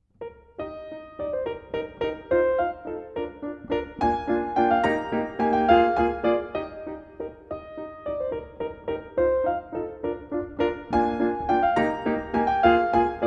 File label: Waltz in Eb